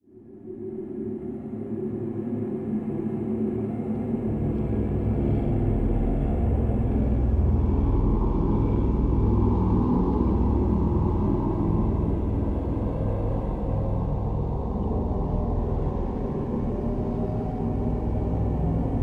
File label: Battleground Cave; World of Warcraft 1.5; Battlegrounds